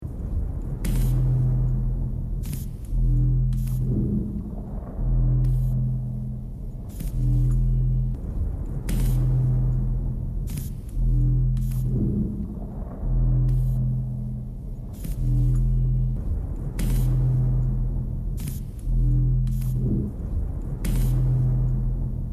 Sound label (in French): Ambiance pesante; Blind Survivor